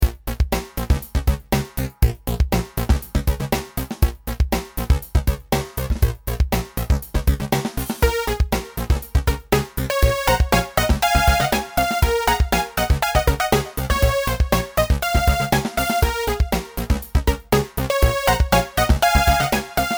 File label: Micro Warm up Bb